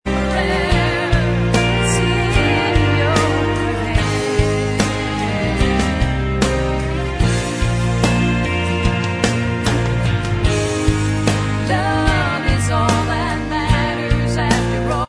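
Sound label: backing vocals Track, backing tracks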